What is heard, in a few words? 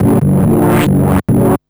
TECHNO SYNTH 140; Keys